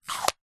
container plastic display case small lid slide close